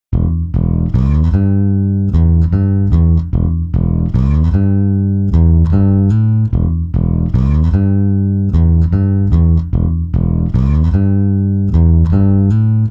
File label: bass, timestretch; roni amazing sd type iv